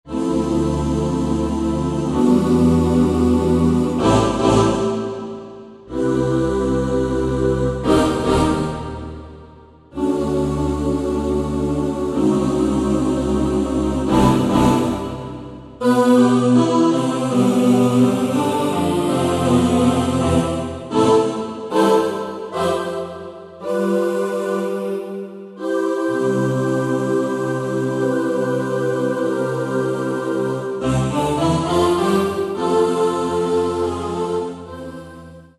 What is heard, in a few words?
X Choir Fader, Korg TR Rack